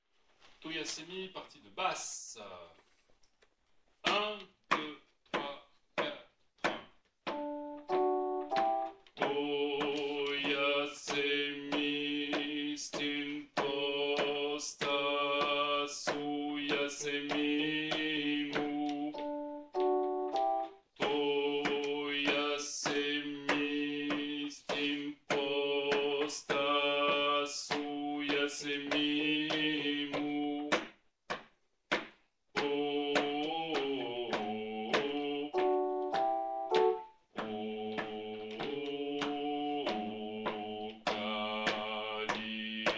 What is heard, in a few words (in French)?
Basses